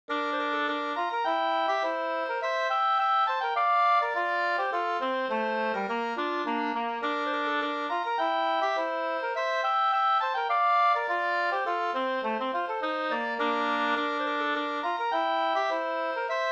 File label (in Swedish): slangpolska 2st